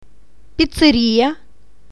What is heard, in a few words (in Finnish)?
ruokala